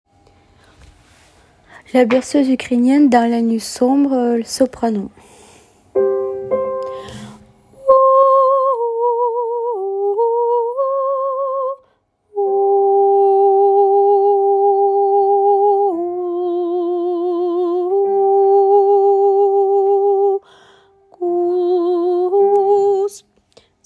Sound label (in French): sop 1, berceuse ukrainienne